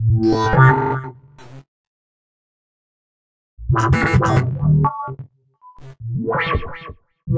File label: Noisy Gate, Synths